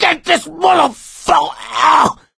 choke; voice; source engine; left 4 dead 2; survivor

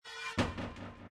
shutter; Source Engine; tf

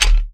menu accept; Left 4 Dead; Source Engine

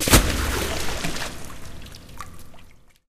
panel splash water; Source Engine; Portal 2

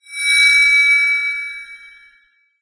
amb random tech; source engine; portal2

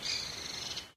forest bird, ambient; Source Engine; tf

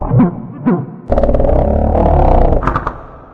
strider hunt; npc; source engine; episodic

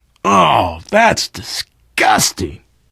reaction disgusted, biker, voice

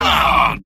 soldier negative vocalization; tf; mvm

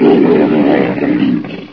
ag alert; agrunt; video games; source engine; bshift

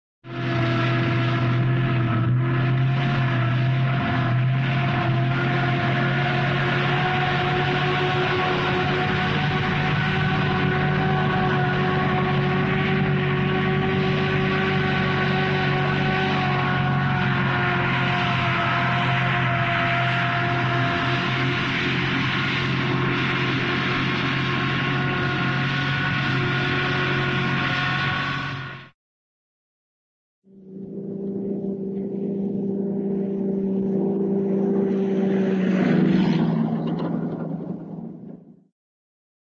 jeep steady driving, truck; cartoon

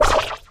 portal exit, portal gun; Source Engine; Portal 2

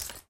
item watch pickup

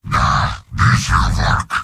positive vocalization; heavy; mvm

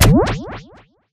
hitsound vortex

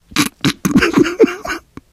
laughter, survivor voice

